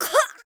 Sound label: Death Grunt; Maeve